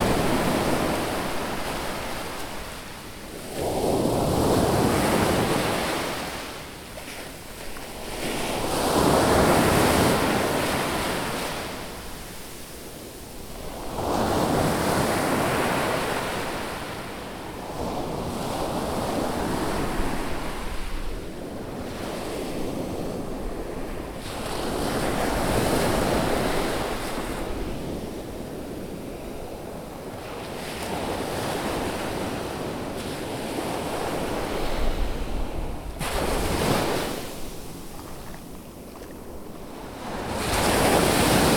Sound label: whirling sea waves at the shore